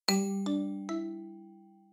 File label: start